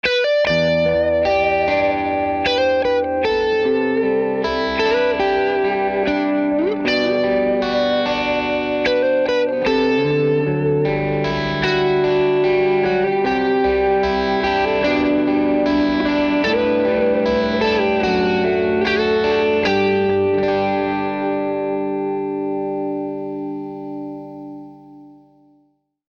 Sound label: Barber Electronics Direct Drive Hollowbody